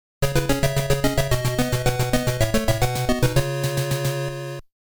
Game Over

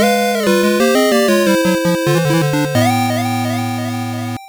Game Over

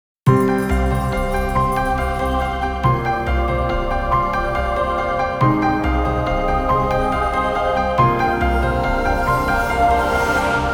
Jingle Get Chaos Emeralds

